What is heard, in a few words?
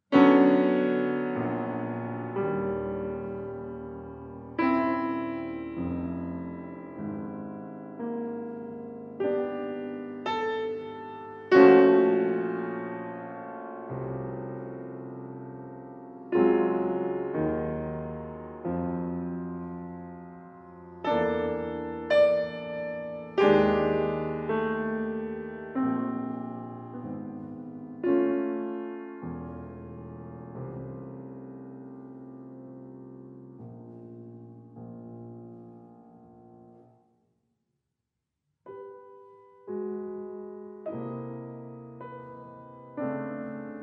Piano Pieces; Six Little Piano Pieces op. 19